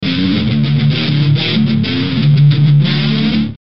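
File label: Musical Element, Drum Snare March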